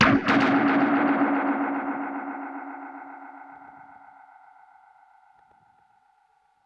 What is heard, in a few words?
Perc Hit, dub percussion one shots; musicradar